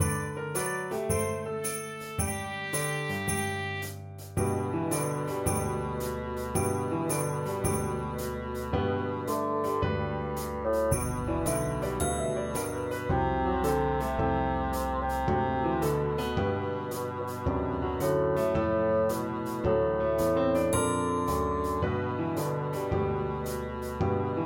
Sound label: Accompaniment and Bassoon; Jingle Bells